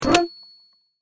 correct

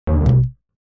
wrong

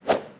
jetpack Hit; fraction robot collector

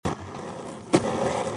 skateboard jump; skate flyer

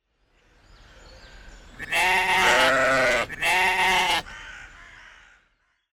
sheep enter; word tumblers

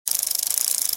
ladder lower; spider drop spelling game

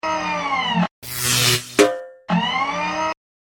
magnet lift; spider drop spelling game

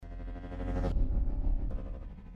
passing Ambient; fractions image reveal cloze

